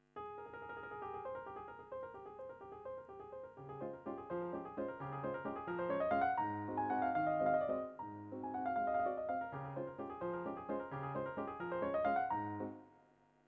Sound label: waltz, ピアノ